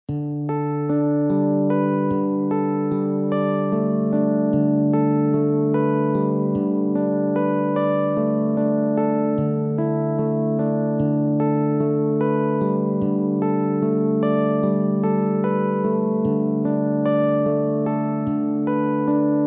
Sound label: Arpeggio